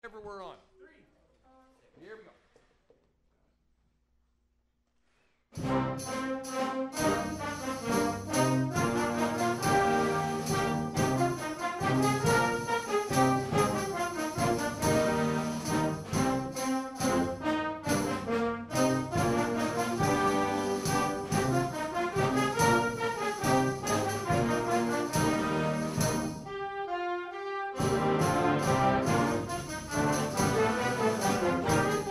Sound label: Bassedanse